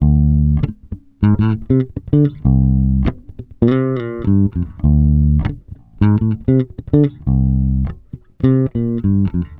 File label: SOUL 100 D, Bass Grooves; USB Soundscan vol.30; AKAI